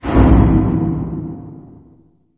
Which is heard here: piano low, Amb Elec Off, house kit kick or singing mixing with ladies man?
Amb Elec Off